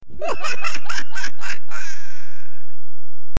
Evil High Laugh

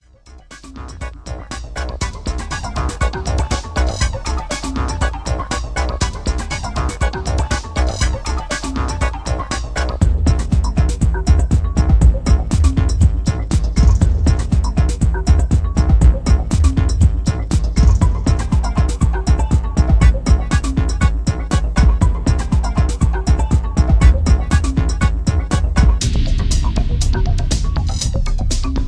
Electro

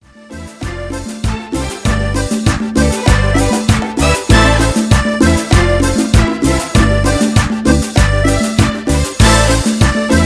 Cumbia; PANA PRODUCTION

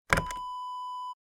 stapler press

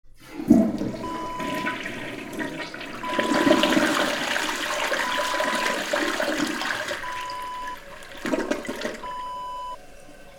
toilet flush

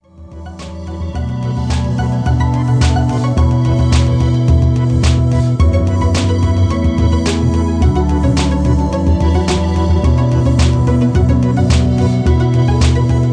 Upbeat Theme

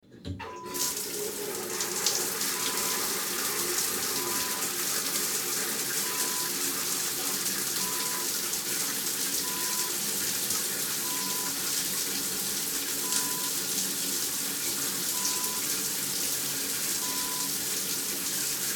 Full Shower

